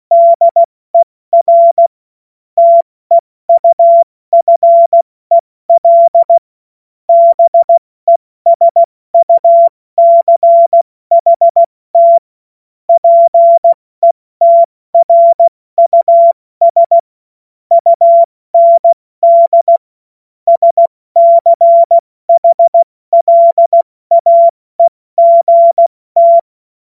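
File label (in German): Witz, cw morsen; Morsekurs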